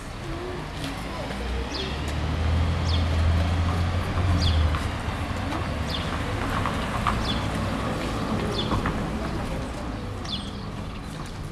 ambiance du bourg; Le bourg

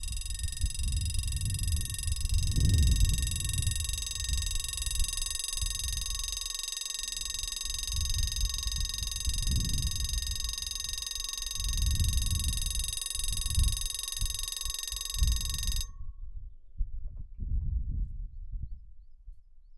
sonnerie barriere du chemin de fer; Le bourg

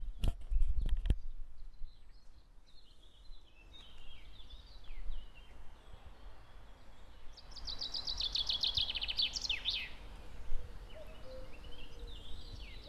le chant du coucou, Paysages naturels

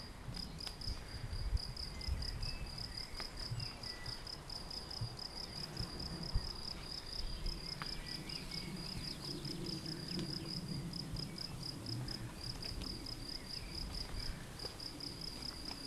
criquets

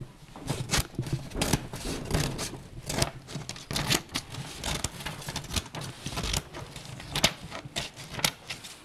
decoupage de papier; Les ecoles

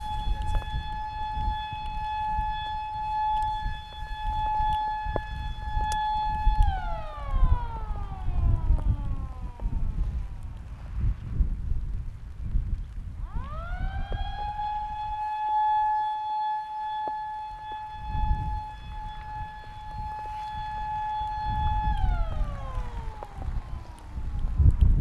explosion carriere; Le bourg

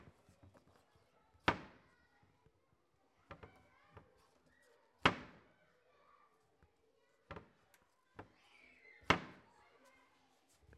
fermeture de la porte de placard; Les ecoles

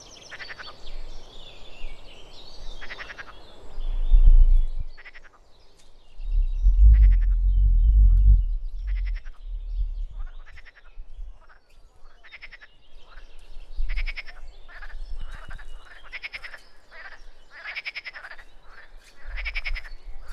le croassement des grenouilles

